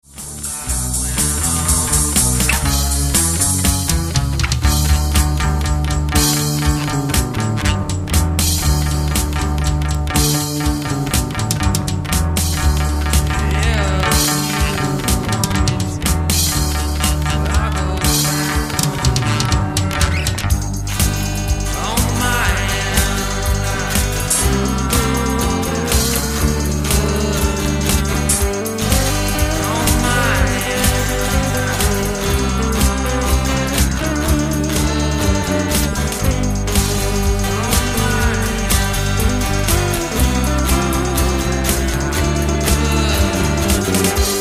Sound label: drum